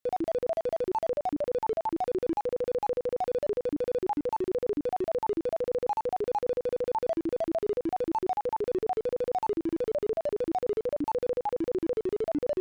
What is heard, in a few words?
beep boop